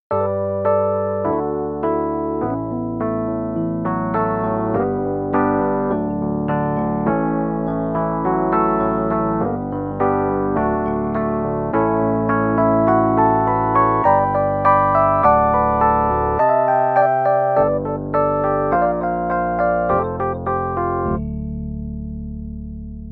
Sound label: Rhds, E Pianos; PC3